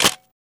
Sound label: 35mm Camera Clicks; shutters & movement